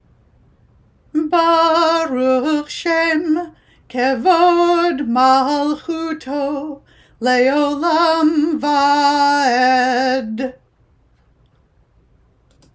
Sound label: Baruch Shem Reform version; Kulanu Joint Friday Night Service